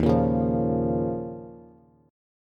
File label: Eb 7th Suspended 2nd Eb, Bass; Eb7sus2 strummed; JGuitar